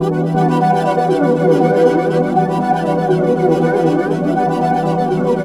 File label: Stoned Pad - 9A; Jungle; Pads; Blu Mar Ten; Jungle - 1989 to 1999